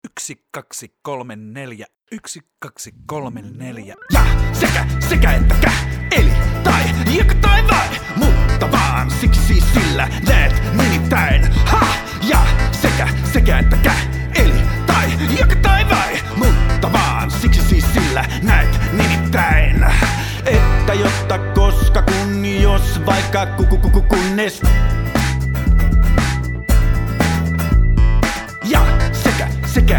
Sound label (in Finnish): rap; alkulasku